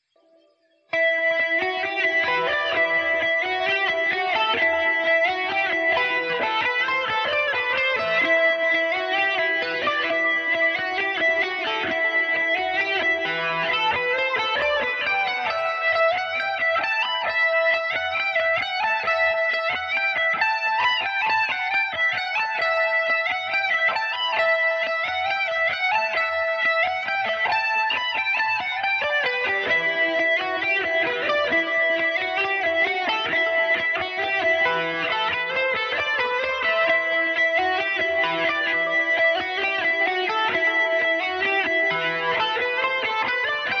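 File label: E minor mandobird, irish mandolin; Is Bridget Mary?; Epiphone Mandobird VIII electric mandolin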